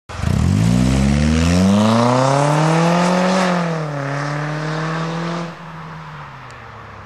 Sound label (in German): Sound Abfahrt